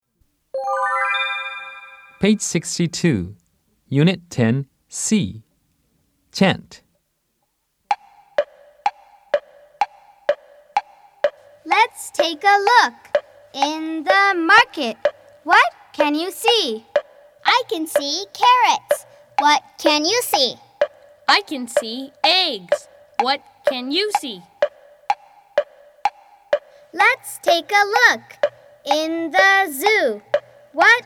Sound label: Chant